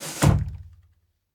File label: Door Slide Close Glass; SFX; The Inn